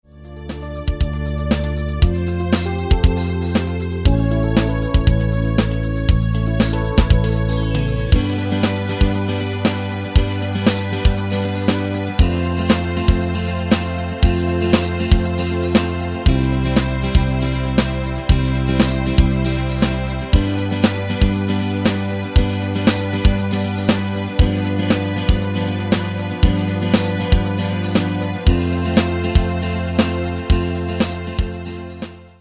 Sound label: Raindrops